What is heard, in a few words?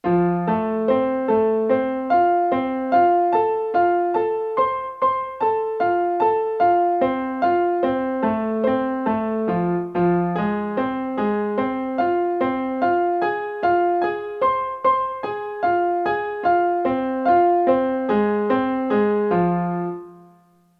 triad and inversion broken chord triplet in F and Fm